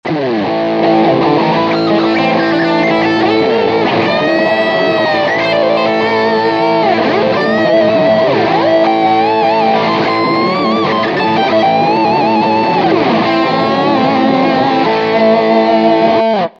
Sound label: MAXON DS830